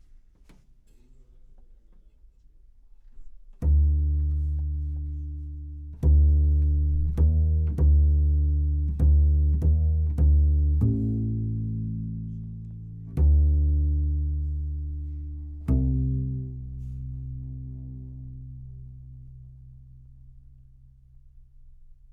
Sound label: C Bass